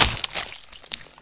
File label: blood